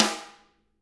DW RIM, Drums; DW KIT1

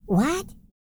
E TALK, Vocal Planet R&B Dance; Spectrasonics

